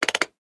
Tnt Switch; Doodle Jump 2; Android

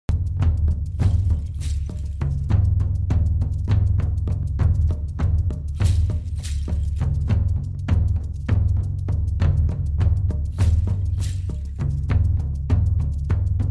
Combat Loop; Aspen; Tut

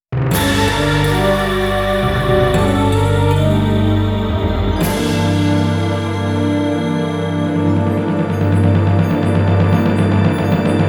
JINGLE Mare Over; NiGHTS into Dreams perfect album

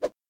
sword

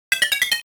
Get Item; Dragon Buster

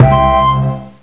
Roman Win; Mystery Tales: Time Travel; NDS

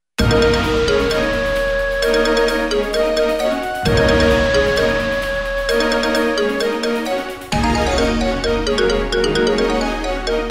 bgm cheerful; Ghostsoul Online

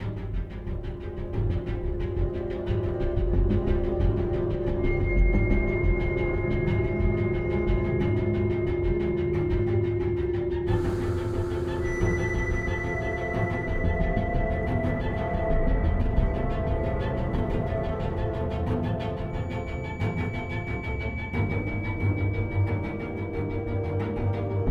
loop Tense; the walking dead michonne 3 what we deserve

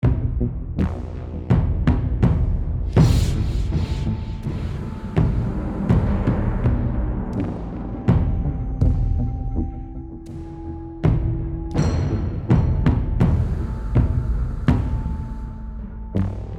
Emotional Tense loop

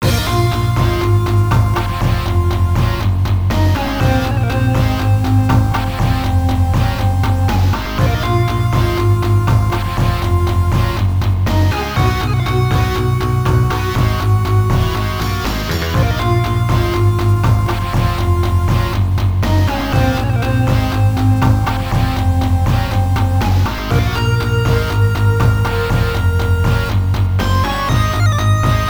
Rain Flute; far east of eden kabuki klash; arcade; Ziria Stage

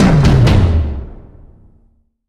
Music Stinger Mud Death; gamerip; Oddworld: Abe's Oddysee New 'n' Tasty

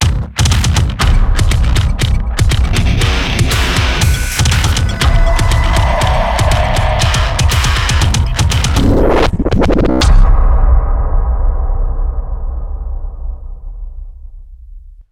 Multiplayer Level Start; modern combat 4 zero hour; android

